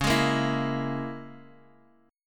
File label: C sharp Minor 6th C sharp, Guitar Open D